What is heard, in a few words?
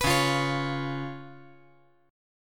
C sharp 7th C sharp